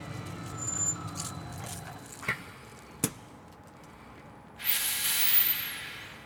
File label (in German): obus pneu; spuren